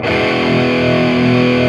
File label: BAR E, HEAVY METAL; Greytsounds